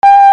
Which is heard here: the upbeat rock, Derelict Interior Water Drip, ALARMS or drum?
ALARMS